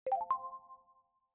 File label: cursor selection; PremiumBeat SFX